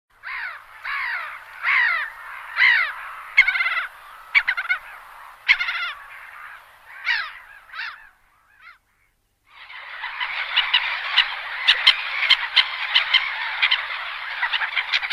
canto do guincho comum

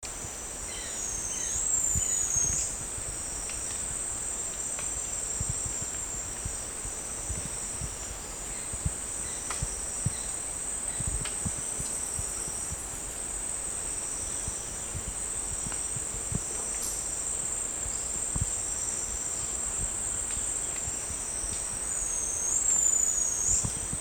canto do beija flor azul de rabo branco

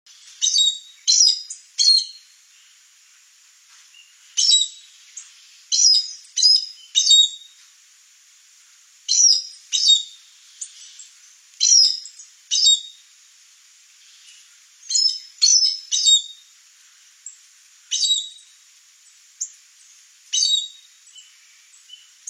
Canto do aracari de bico branco

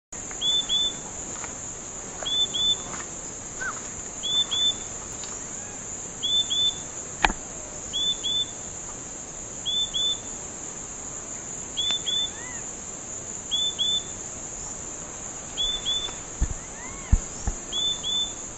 canto do gaturamo capim

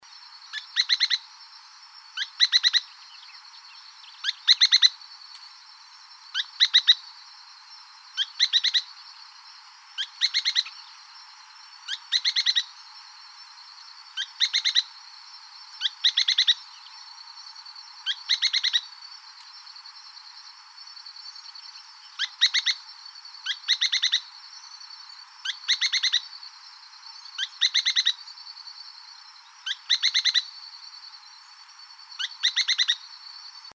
canto do joao tenenem ardosia

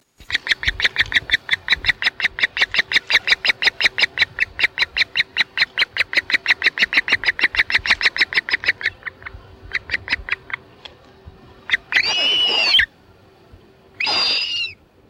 Canto da Fragata

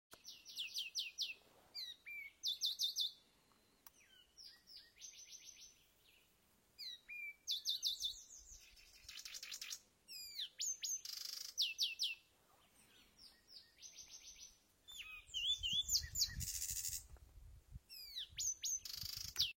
canto do picanco de bico amarelo

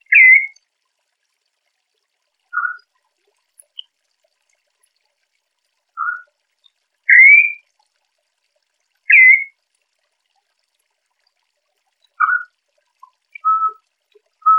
Corrupião Piando Para Esquentar Macho

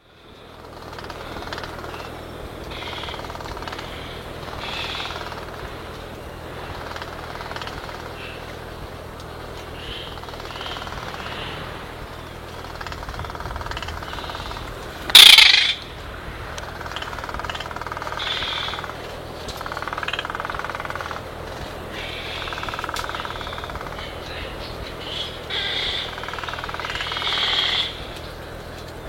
Canto da trinta reis preto

